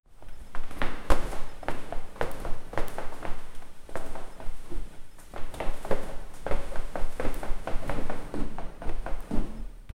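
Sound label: curtains flap rhythmically; approach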